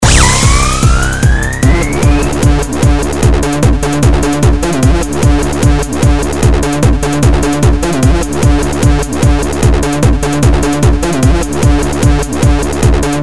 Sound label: Techno Rave